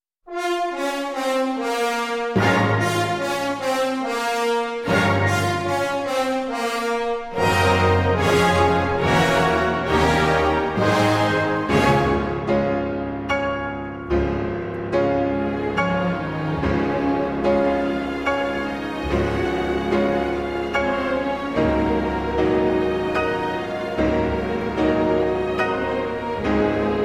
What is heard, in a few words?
Piano Concerto No. 1 in B Flat Minor, Allegro Non Troppo e Molto Maest